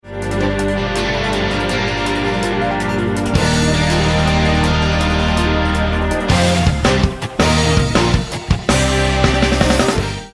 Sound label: intro music; freak fortress 2; nue